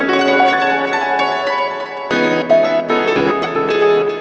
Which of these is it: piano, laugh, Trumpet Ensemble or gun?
piano